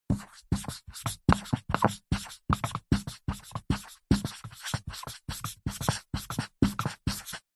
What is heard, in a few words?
office whiteboard pen writing hard